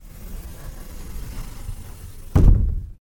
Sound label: Fusuma; jidaigeki